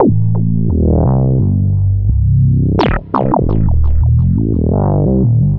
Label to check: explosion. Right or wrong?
Wrong